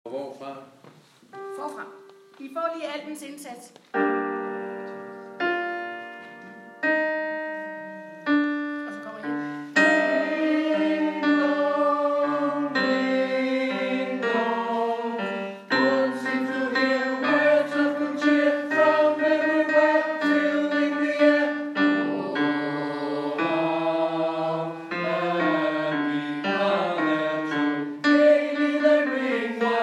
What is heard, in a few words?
carol of the bell tenor